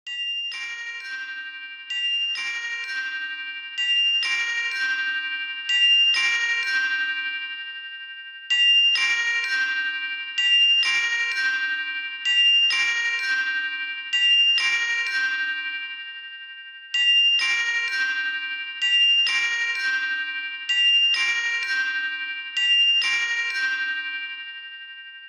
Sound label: Blink bells, ringtones